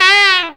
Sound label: FUNKY SAX; Zero-G; Funky Elements